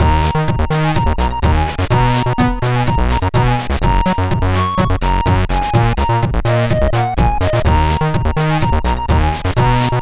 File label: Sax, MOS6581R3